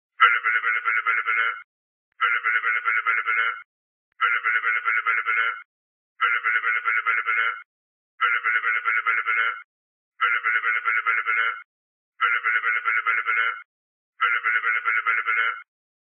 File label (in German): teleschnecke klingelton